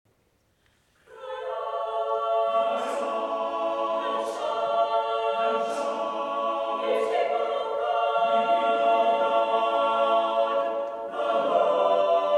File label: acapella